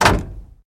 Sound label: Chevy Car Door Close